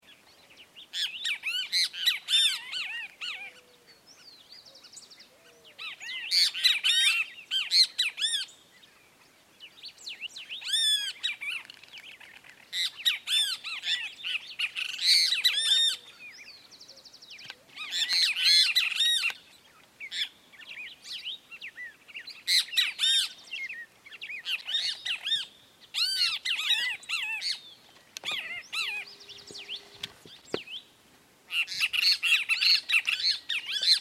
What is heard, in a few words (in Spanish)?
Benteveo canto entre dos ejemplares; aves